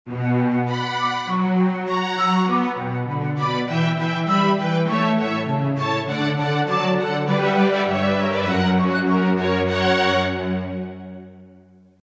Instrumental; Bach; St John Passion

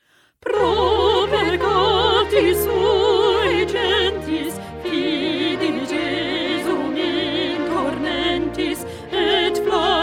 Stabat Mater Concert Version; Pergolesi

